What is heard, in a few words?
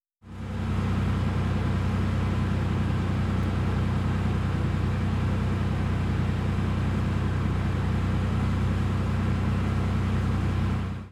Truck idle original sound